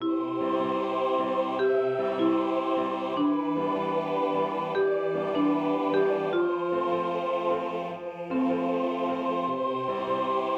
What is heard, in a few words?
Solo, soprano